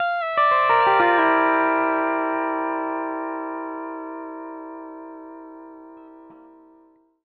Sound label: PD LOOPS 120, Whole Lotta Country